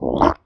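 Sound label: toad attack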